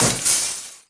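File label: Vase Collapse; objects; Star Wars Obi-Wan; XBOX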